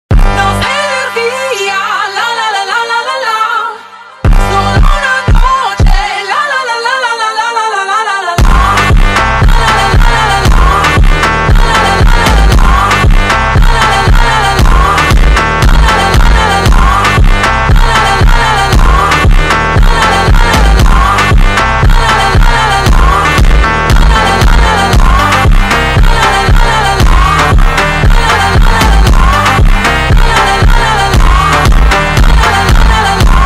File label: funk